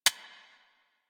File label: Decoy Counter Measure Mech